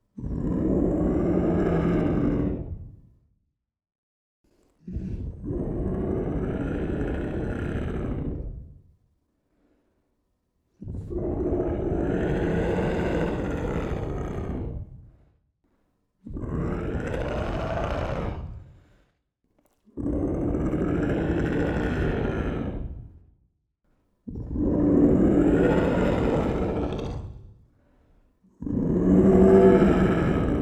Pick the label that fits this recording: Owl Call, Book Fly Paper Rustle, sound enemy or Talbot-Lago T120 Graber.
sound enemy